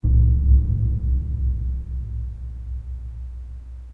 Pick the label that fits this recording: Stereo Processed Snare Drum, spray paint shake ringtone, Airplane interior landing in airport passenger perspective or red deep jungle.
red deep jungle